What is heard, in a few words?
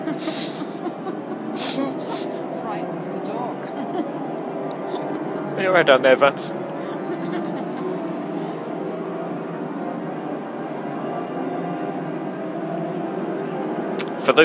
music at London Guildhall